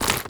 zombie move short, foley; Half Life Model Pack

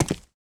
footsteps concrete; player

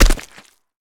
land mud, footsteps; player

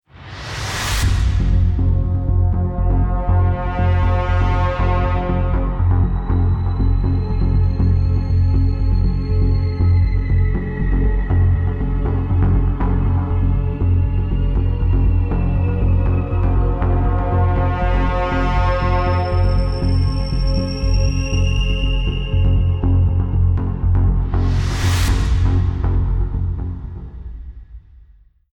start round, music

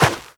scuff, footsteps, player, carpet

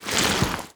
close, zombie blind, foley; creatures

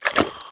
breath in, headcrab black; creatures

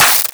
spark tiny

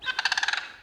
crane; anubis

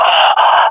death, swat fem; Counter Strike Model Pack; CS2; agents

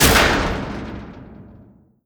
elevator end; scripted sequences; reviver

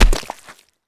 land mud, footsteps; player

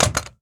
weapon shotgun impact; physics

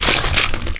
fs fm glass, footsteps; Portal 2

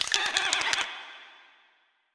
shake, halloween

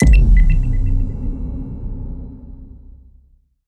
game ready; Counter Strike Model Pack; CS:GO; panorama

